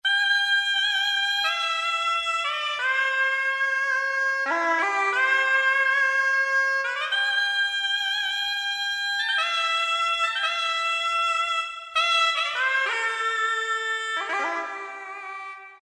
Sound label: BESUA REED; WIND; Spectrasonics Heart Of Asia